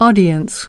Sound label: audience